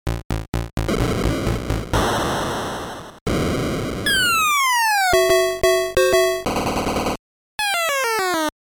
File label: Arcade Game Jingle; Maniac Mansion